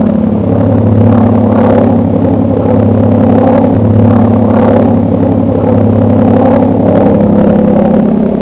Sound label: Pitts, Model; FMS